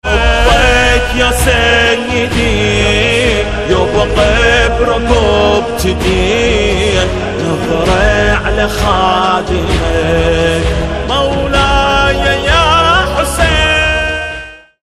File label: ringtone